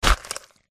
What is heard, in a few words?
dog demon attack contact middle